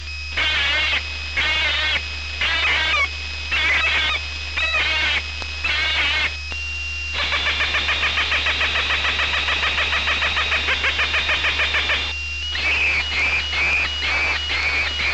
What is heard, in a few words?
Bird signal